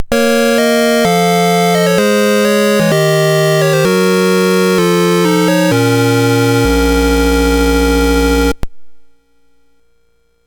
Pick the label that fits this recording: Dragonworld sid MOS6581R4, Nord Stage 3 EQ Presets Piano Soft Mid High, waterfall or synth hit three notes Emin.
Dragonworld sid MOS6581R4